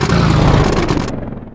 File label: fan motor shut off, airboat; hl2